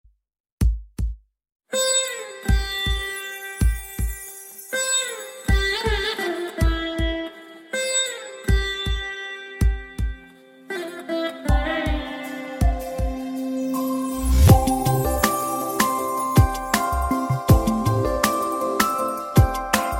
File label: Music